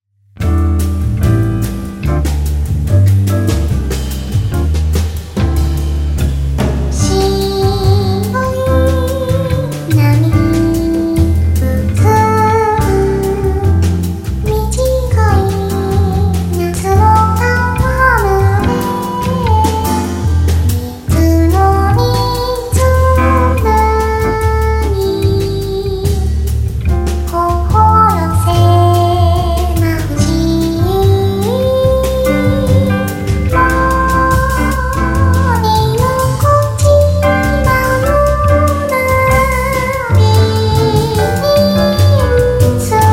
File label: Bossa Nova